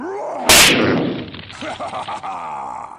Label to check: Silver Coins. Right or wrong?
Wrong